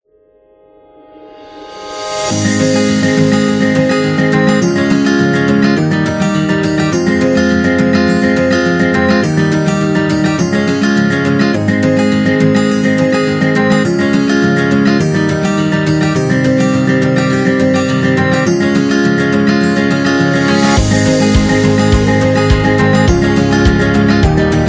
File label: Acoustic Guitar; corporate background